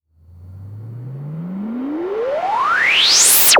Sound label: FX Sweep Up; Sweeps Up; Vengeance; Essential Clubsounds Vol.3